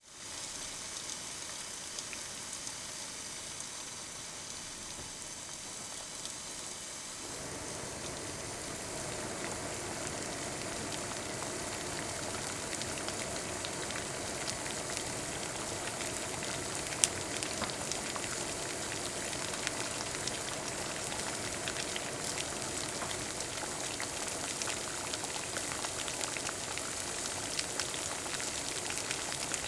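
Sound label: Water Bubble Boiling Pot of Water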